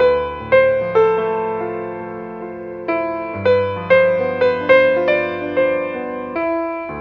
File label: piano, Loops, Sad